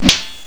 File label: warrior attack; megaglest